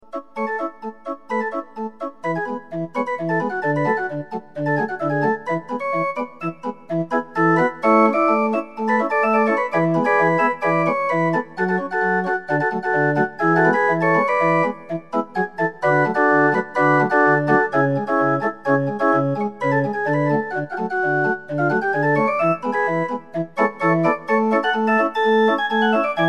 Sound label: organ